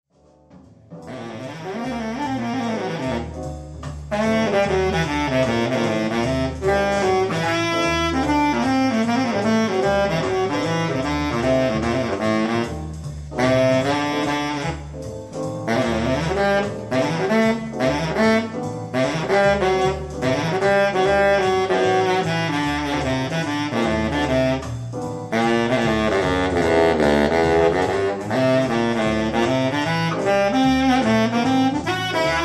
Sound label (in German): Moll II-V melo